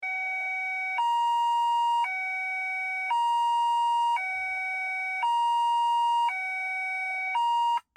China ambulance; 911 Signal

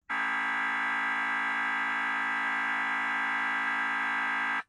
Airhorn, emergency vehicle safety warning; SUBTOWN; 911 Signal

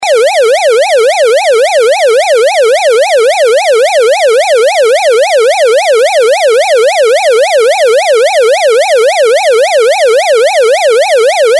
Woop; 911signal

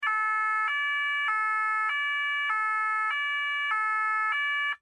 Francia police, 911signal

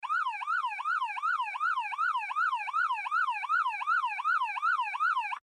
Yelp; 911 Signal

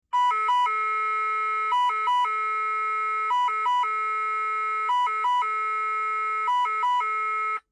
Italia ambulance; 911 Signal

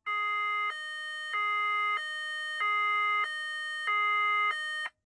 Hi Low; 911 Signal

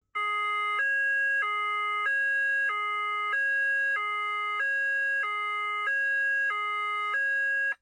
Italia Police; 911 Signal

